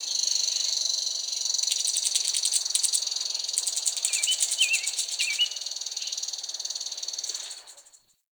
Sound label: Tree Pine Critter; Ambience; Trees